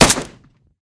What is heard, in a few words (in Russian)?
zvuk odinochnogo vistrela iz vintovki